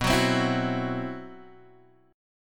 C Major 7th Flat 5th B

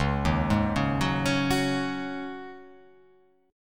C sharp Suspended 2nd Suspended 4th, C sharp

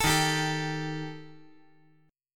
Eb Major Flat 5th Eb

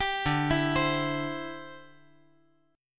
D 9th Suspended 4th D down

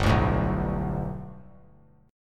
G Major Add 9th chord; Gadd9 strummed; JGuitar

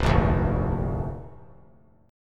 C 9th Suspended 4th C down 3C, 3F, 3A, 3D; C9sus4 strummed; JGuitar

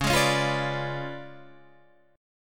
Db 7th Flat 9th, Guitar, Open D

